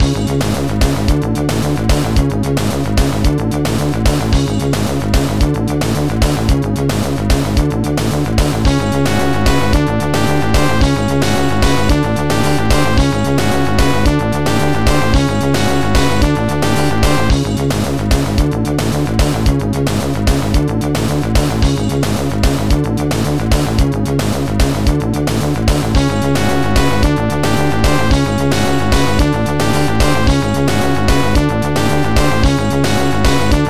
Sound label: BGM minigame Inter Boss